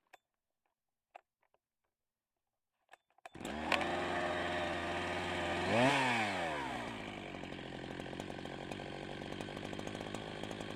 Chainsaw Large - ONBRD - STEADY RPMS - Start Run Off - EXHAUST; Pole Position; The Garden Tools Library